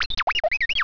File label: success r2d2